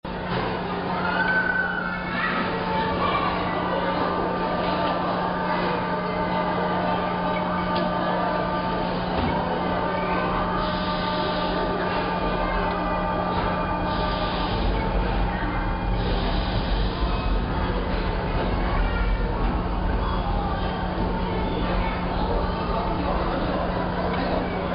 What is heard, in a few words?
motor, japan